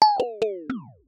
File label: Low Battery